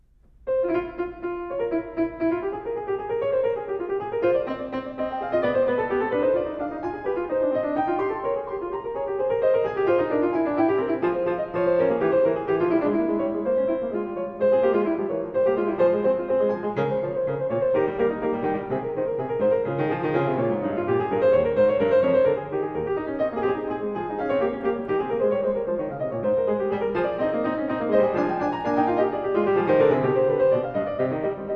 Prelude And Fugue In F Minor, Well-Tempered Clavier; BWV 881; Fuga; Johann Sebastian Bach